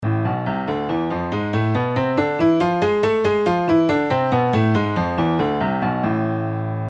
Ля минор 2 октавы

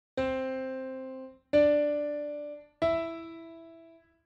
oktavy

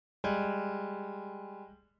malaya sekunda

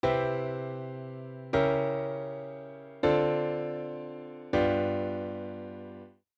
cepochka akkordov do lya fa sol

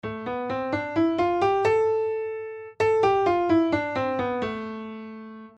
Ля минор

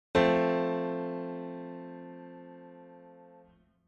Mazhornoe trezvuchie

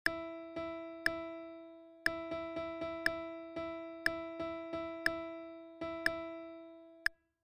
Ritm dve chetverti

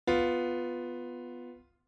triton Si Fa

